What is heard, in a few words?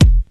good kick drum single shot e key, kick drum tuned to the E note; kick drum samples